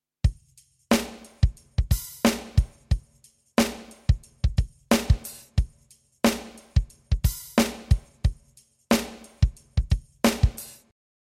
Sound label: drums bloom